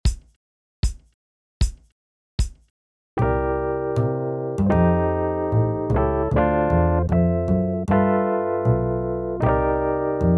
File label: Backing track for Drums; Big Dipper; Jazz Excerpts